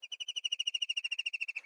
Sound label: Amb Env Bird Chirp